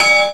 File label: Slammed Shut; SNES; Clock Tower